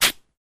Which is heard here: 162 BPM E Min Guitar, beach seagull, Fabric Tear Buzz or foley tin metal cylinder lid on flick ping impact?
Fabric Tear Buzz